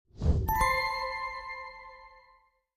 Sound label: Card Flip; Piano app by Yokee